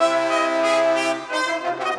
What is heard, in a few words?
Brass; Sonic Foundry (Sony Creative Software); Orchestral VOL-2; Modern 16